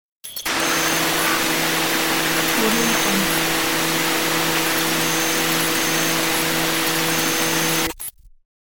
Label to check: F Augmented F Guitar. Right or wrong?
Wrong